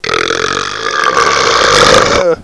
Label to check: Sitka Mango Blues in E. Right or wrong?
Wrong